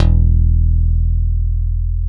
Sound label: E-1 PICKED, METALLOID; AKAI S-Series CD-ROM Sound Library VOL-7